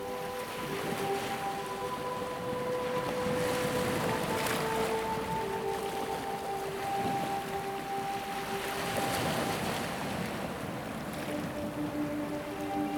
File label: Ocean Waves